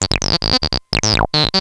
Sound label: saw